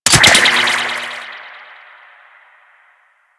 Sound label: Taser Shot A; Warframe; Grineer Eel Lancer